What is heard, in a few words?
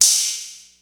Ride, Ensoniq ASR-X Pro